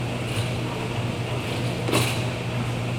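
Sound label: machinery in loop; Garry's Mod; tf2; cp harbor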